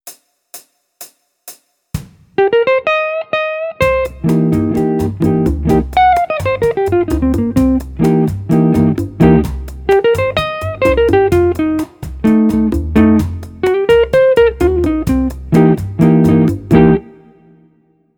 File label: Jazz, Guitar